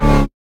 Boss Core Hurt; Avie

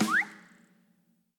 Drum Roll End; DiegeticMusic Amusements Cannon; LEGO Horizon Adventures

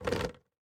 Bollard Raise Stinger; Going Under

